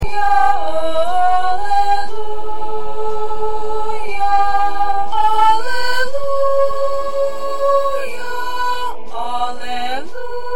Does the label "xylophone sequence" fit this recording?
No